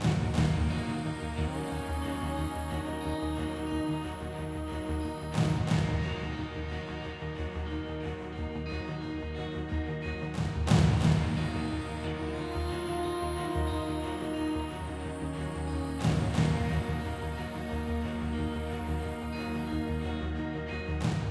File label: Tension Med; Great Wall